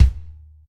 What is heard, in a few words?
short reverb kick drum sample e key; kick drum samples